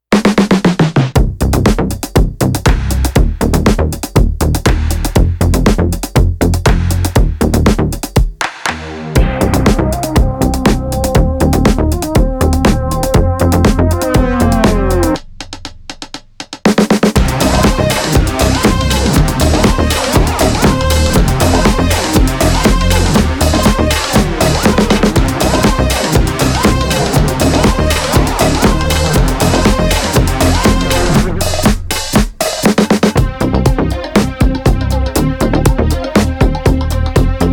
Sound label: DEAD DISCO